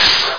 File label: roar; parasite